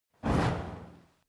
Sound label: fire torch whoosh fast; swooshes-swishes